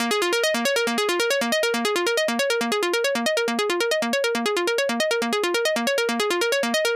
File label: Pluck DRY 138BPM, A#minor; Melody & Synth; Vengeance Samples; Fusion Kit